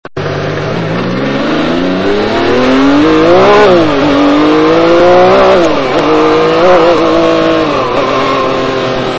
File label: Ferrari F50 koenig acceleration